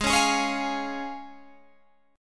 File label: C sharp Major Ab; C#/Ab; strummed